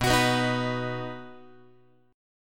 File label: B Major B down Guitar 4A 4E 5A 5D 5G sharp 6C sharp; strummed; JGuitar